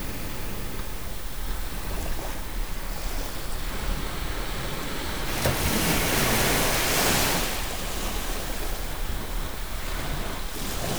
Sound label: white noise; green noise the white noise alternative